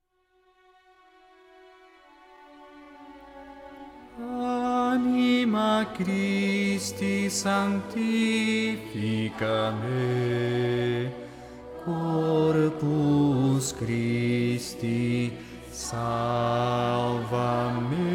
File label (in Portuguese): Baixo; Anima Christi